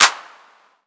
Clap, 808 Claps; Slate; Vintage Drum Machines Volume 2 808 Edition

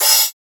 hat slide, Open Hat, Drum One Shots; SD MIDNIGHT Sample Pack; Slate

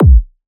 kick mansion; Drum Sample Pack; One Shots; Slate; SEISMIC

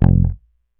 Bass one shot F, Electric Bass; Slate Digital BASSHOLE Sample Pack

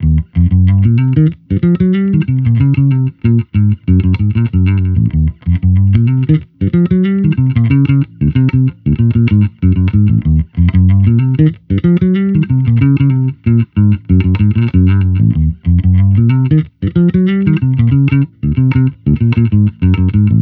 Amped loop 94 Eb, Electric Bass Loops; Slate Digital BASSHOLE Sample Pack

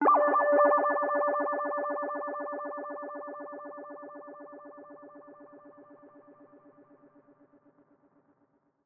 pluck radar D; Melodies; One Shots; Slate; AURORA Sample Pack

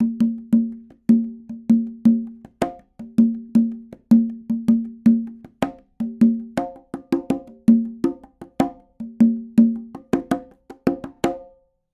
afroconga loop 80; perc construction loops; SEISMIC Drum Sample Pack; Slate

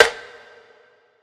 snare snappy; one shots; SEISMIC Drum Sample Pack; Slate

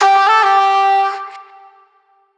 Vocal Sample Pack Phrases G; Slate Digital Omnivox Vocal Sample Pack

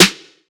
snare stacker; one shots; SEISMIC Drum Sample Pack; Slate

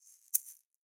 shaker single hit; Raw Perc One Shots; Slate; SD SEISMIC Drum Sample Pack

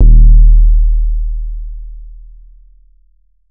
808 one shot Eb; Slate Digital BASSHOLE Sample Pack

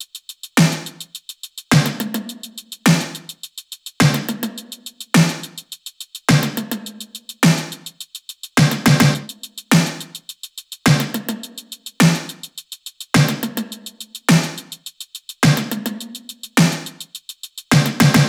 top 105, Drum Loops; SD MIDNIGHT Sample Pack; Slate

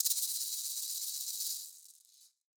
maracas Roll; Raw Perc One Shots; Slate; SD SEISMIC Drum Sample Pack

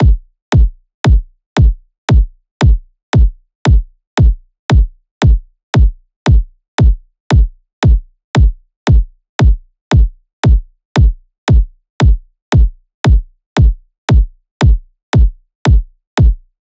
kick 115, Kick Loops; drum sample pack; SEISMIC; Slate

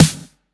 909 Snare

